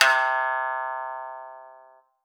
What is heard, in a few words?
Shamisen C4